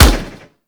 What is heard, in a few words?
Fire Shot, Weapons; Warframe; Burston